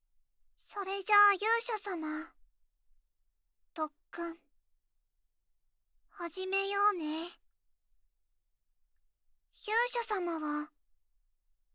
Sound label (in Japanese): キス音, ASMR